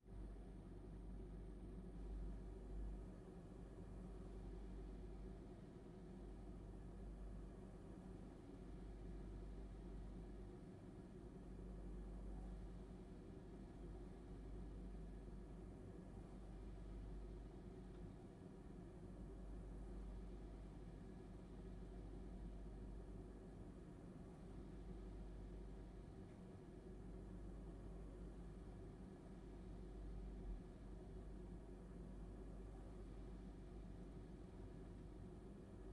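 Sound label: ambience roomtone quiet room air conditioning hum hiss; surround; fvtt sonniss gdc audio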